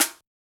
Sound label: Yamaha DJX 2B, SD Jungle